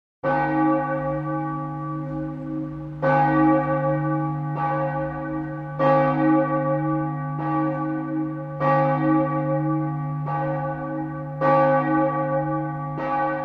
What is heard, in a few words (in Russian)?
zvuk boja kurantov